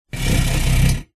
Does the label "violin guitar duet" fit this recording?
No